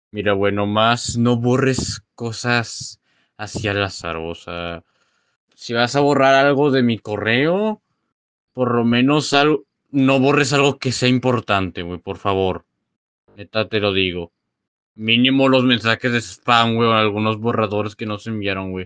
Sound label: ACAPELA; VOZ NATURAL